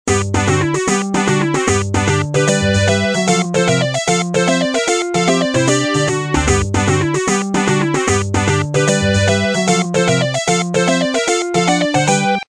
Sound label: Nivel Completado Level Complete